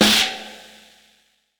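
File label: FX snare drum, drums acoustic; FX KIT; USB Soundscan vol.10; AKAI; 90s sample CD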